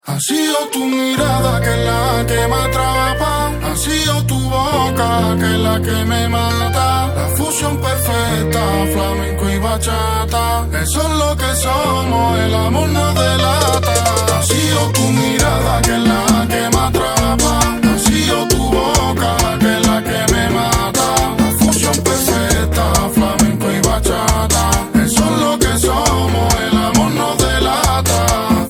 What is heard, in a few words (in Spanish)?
flamenco y bachata